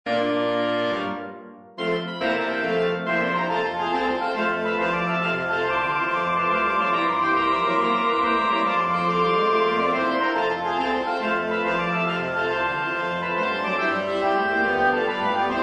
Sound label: Saxophone; Fillmore; Men Of Ohio